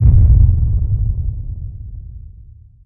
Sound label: Small Explosion C2; Zero-G; Club Techno